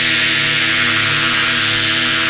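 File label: beam loop; Shadows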